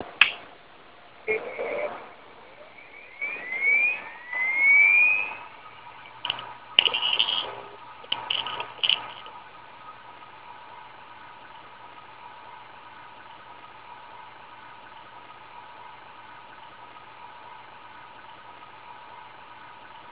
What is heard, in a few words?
seagate 7200 11 maxtor diamondmax 22 spinup; hdd